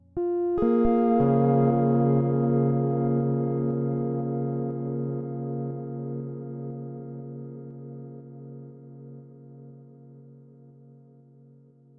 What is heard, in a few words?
Guitar